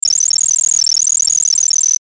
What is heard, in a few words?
Data Transmission Fast Number Beeps; Communication; Blastwave